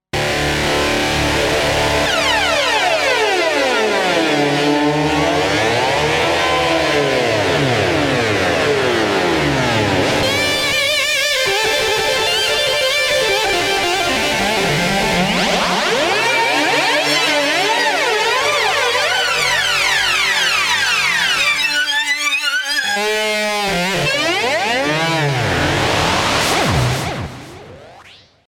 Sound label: Montage